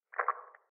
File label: splat